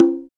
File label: RM CGA MD P, Drums; Drums In Your FACE!